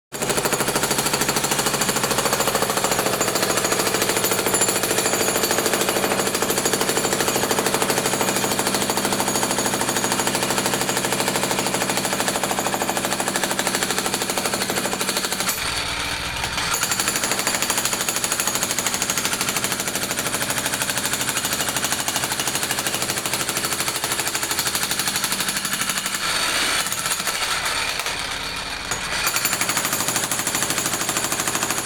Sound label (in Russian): Otboinyi molotok